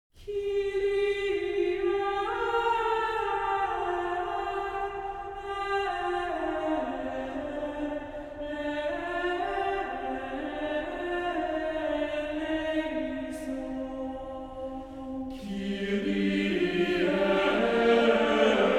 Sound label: Kyrie Eleison A Medieval Mass; Lenten Sunday